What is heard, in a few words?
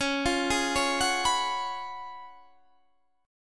Db 11th Db, Guitar, Half Step Down; arpeggiated; JGuitar